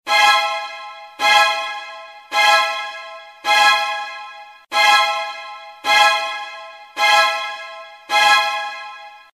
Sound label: tension music